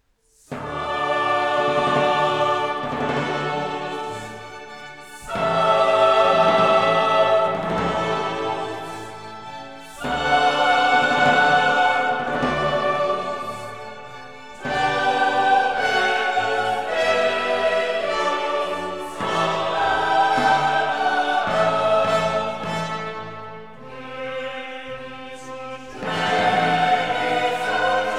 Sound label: Requiem In D Minor - Sanctus; Mozart; K 626